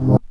Polaris Stab Rev Vinyl Synths C2; vinyl chords

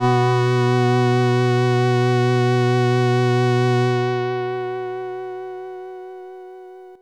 Synth, Swordfizh

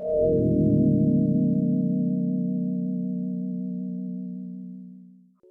SYNTH; Stetlz Moodz Misty

